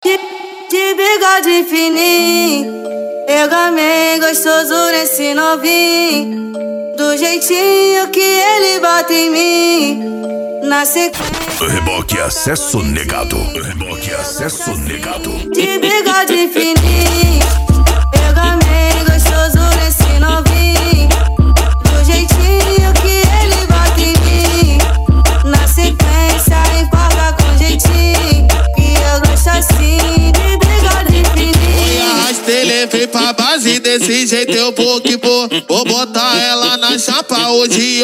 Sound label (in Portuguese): racha eletronica e funk mega bass